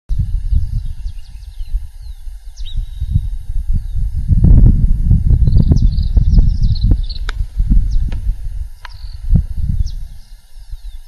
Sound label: Nothoprocta ornata; El Infiernillo